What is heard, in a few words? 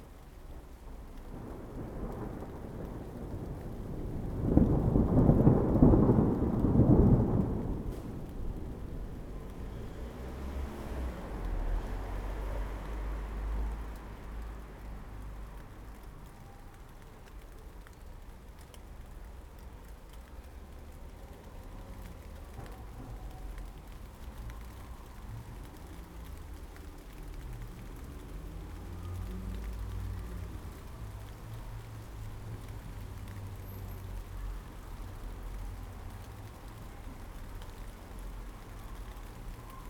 distant thunder; 99Sounds; Rain And Thunder